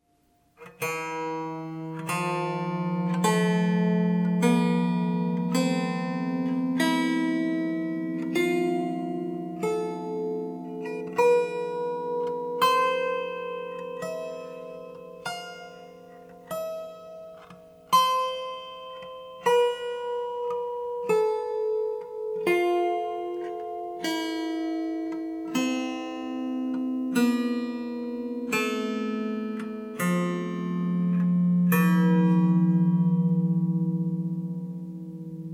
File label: Lyre Monocorde 432